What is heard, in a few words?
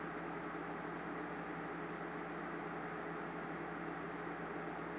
SPECAMB; AMBIENCE; PaxImp; SPECIES; PC Gamer 50